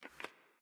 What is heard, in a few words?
bricks Indent; cashomatic